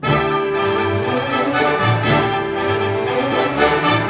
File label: HIGH, ORC Highland; East West - Scoring Tools